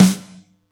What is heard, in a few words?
Yamaha EX-5